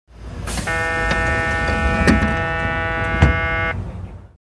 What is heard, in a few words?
door close